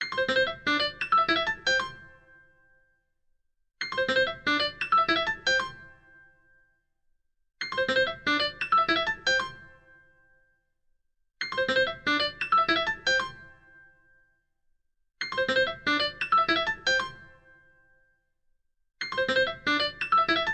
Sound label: Simple Simple Piano, ringtones; LG Velvet